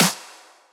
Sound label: snare, electro